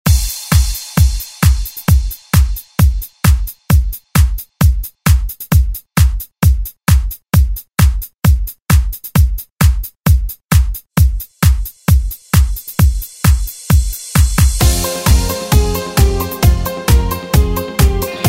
70s Re Drum

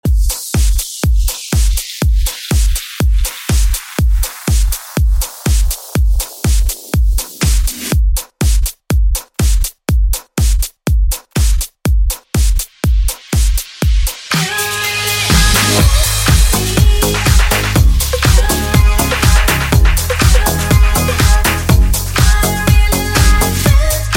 Club House ReDrum 122Bpm; remixplanet

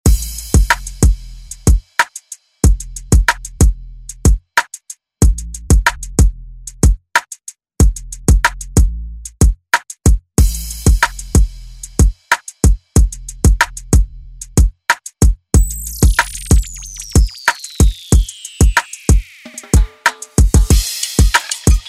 Re Drum

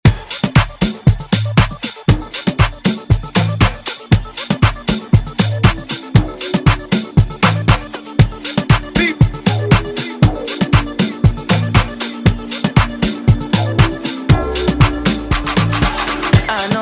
Afro Dance Redrum

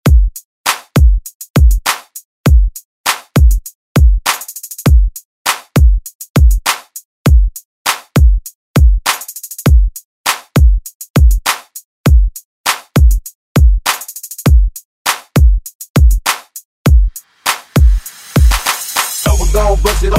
Party Hype Redrum; Deejay Hit

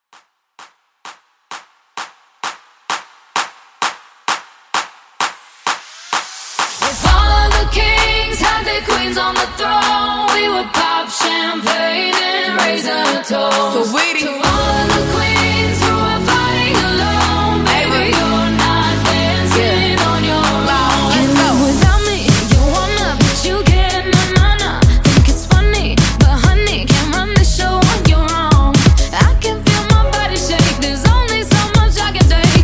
Top40 Party Starter 128 Bpm

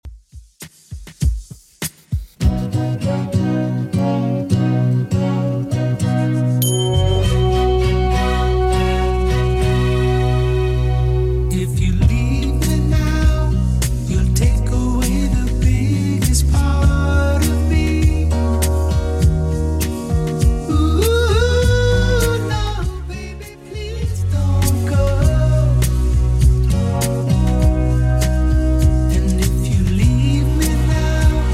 70s Redrum 100bpm

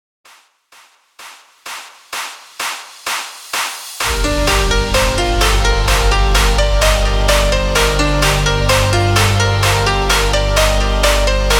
CLAPAPELLA 128 BPM

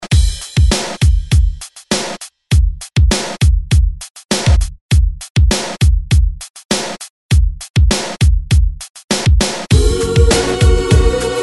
ReDrum 100Bpm; DJDOLLS

